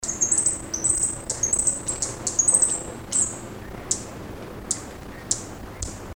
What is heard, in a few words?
Fire breasted Flowerpecker, birdcall